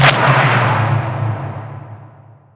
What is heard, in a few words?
ink phantom damage; weapons; dota; grimstroke